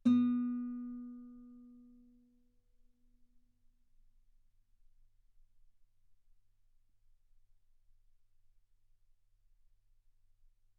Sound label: Guitar ff B3; sul B